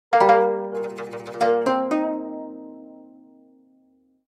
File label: Dialogue Box (Gayageum); B Path of the Teal Lotus